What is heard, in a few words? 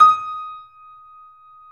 Small Pianos, 3-D Audio Collection